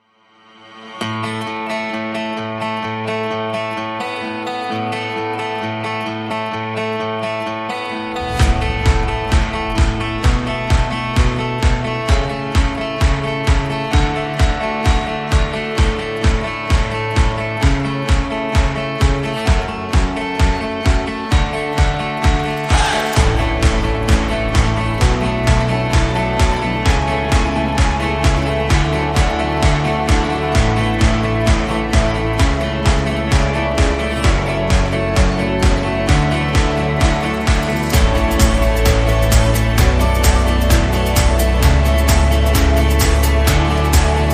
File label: Guitar Freedom, Rhythm